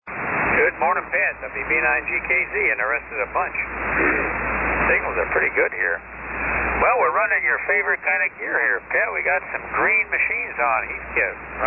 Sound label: Heathkit SB-102 & SB-200 Amp; Neenah, Wi.